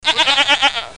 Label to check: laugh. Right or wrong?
Wrong